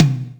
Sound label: Toms